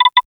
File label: modern and short file sel, windows